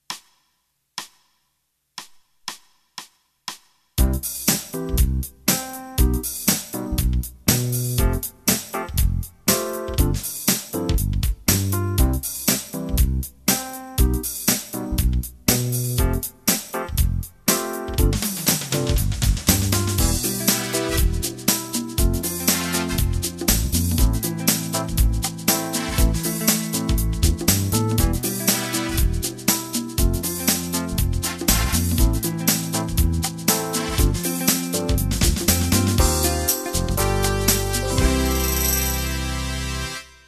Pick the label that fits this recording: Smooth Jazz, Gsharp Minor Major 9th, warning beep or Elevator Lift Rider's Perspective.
Smooth Jazz